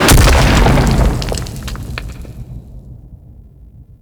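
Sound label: Boulder Impact; Ambience; Gameplay; Warframe; Orokin Moon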